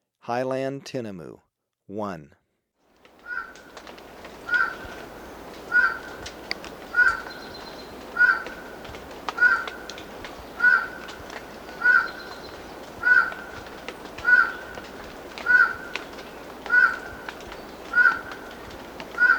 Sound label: Highland Tinamou, Birds of Ecuador; S. America